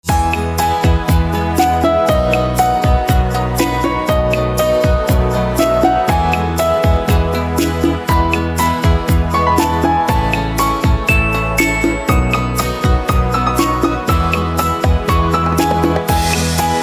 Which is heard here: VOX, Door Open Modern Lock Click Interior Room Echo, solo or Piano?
Piano